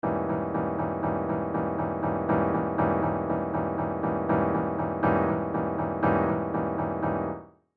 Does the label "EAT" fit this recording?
No